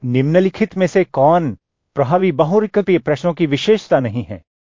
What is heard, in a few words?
techno